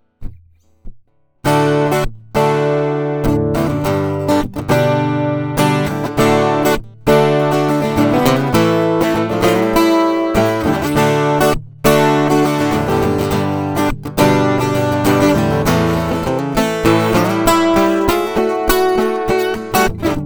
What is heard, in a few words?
ovation 12 pop